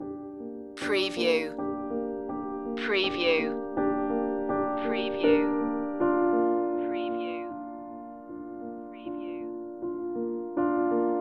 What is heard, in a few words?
ambient singularity loop